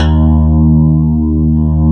CLAV, FUNK FM CLAV

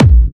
Clubby Kicks, Bassdrums

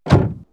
Metal Impact, perc fx; X-Static Goldmine

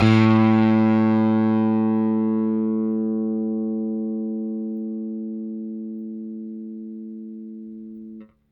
Rickenbacker Bass; Airwindows

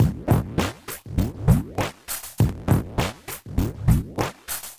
perc woophop, Percussive Loops, 100 bpm

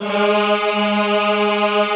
EHH G#2, Classical Choir; Best Service

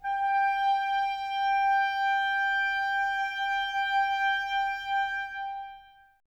WND CLR, Clarinets; Miroslav Vitous - Woodwind Brass Ensemble; 90's Sample CDs

